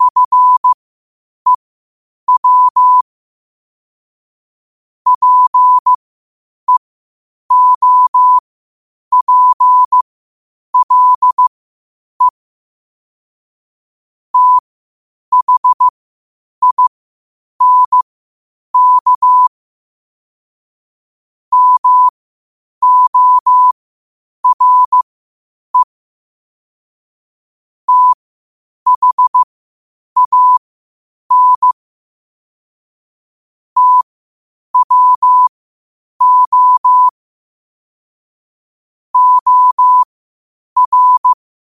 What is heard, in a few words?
5 wpm, morse